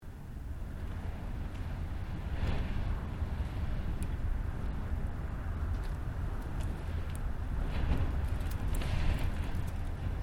Wind Extreme Loop; Elemental weather effects

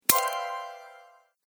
Loot Bonus, video game

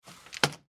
Bathroom Window, Window Blinds Lower; Household; Blastwave

